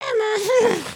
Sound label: need to pee look; emotes